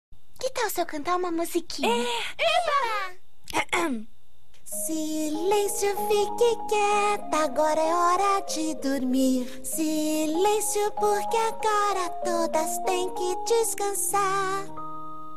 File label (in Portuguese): Portuguese, Brazilian Portuguese; Season 1